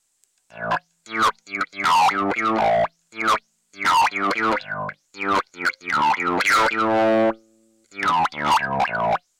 Waldorf 2 Pole Bass Env Follower HP Drive

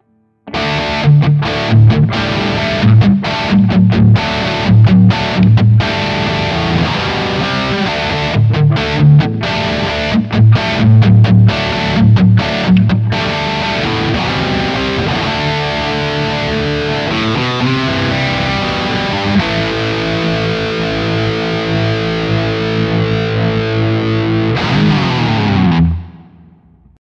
Les Paul Highgain Rhythm Neck Bridge Pickup; Slash Signature

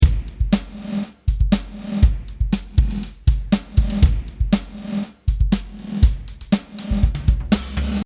Beat Reverse

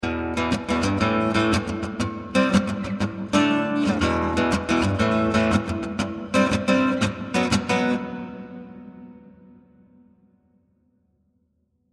Stereo Hall

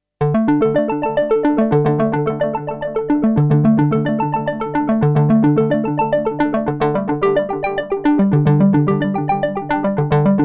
matrixbrute2 arp

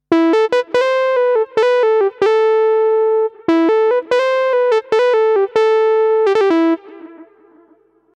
Arturia V Collection X Mini V Synth Lead

